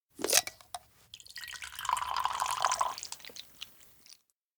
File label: shaker cap off pour; anemoi